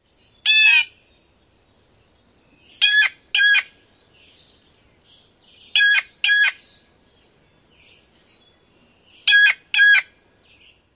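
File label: jay and jay-jay calls; NY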